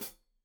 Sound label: Hat Foot, Hi-Hat, Cymbals Stereo